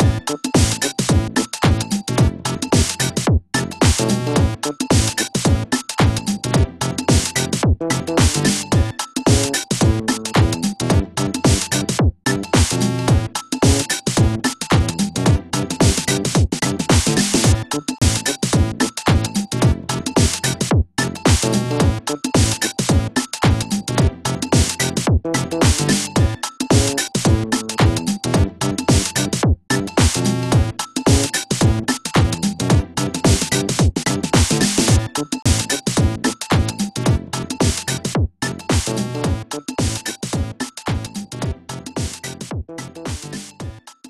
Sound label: Funk; Taiko no Tatsujin Chibi Dragon to Fushigi na Orb 2012; 3DS